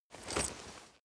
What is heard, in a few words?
leather metal walk normal single